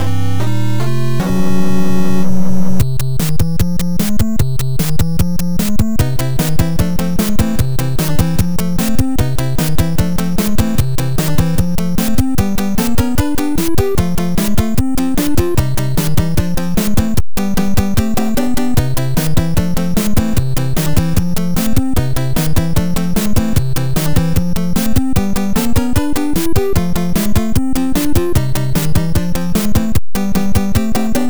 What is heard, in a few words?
nes enemy battle; super mario bros 3